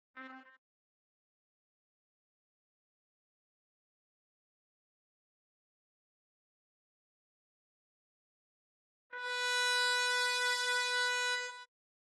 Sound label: Trumpet; Holst Jupiter